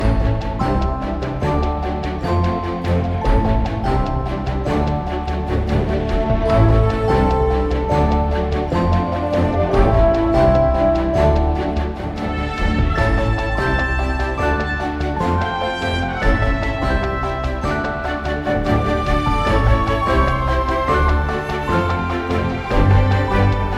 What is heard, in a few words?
Menu Loop